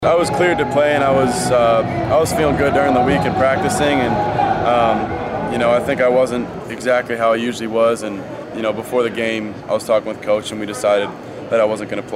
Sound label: HORN